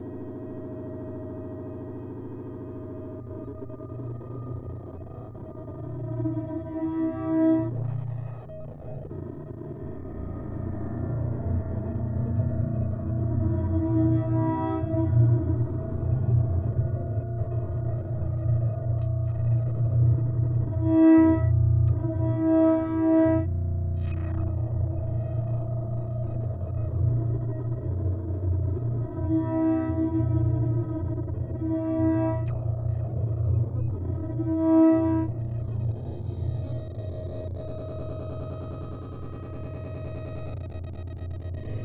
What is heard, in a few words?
granular